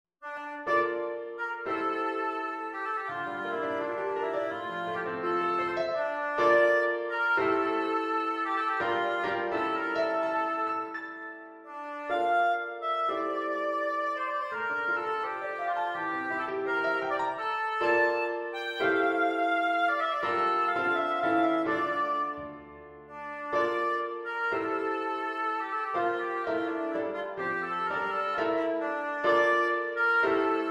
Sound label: OBOE Relaxing